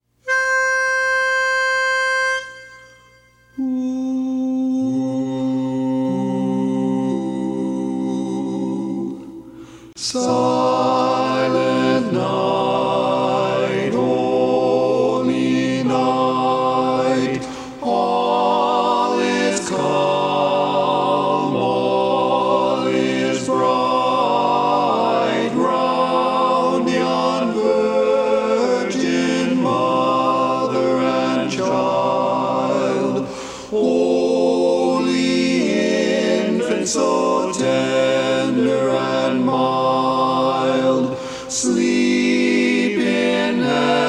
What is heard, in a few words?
Silent Night baritone, A Capella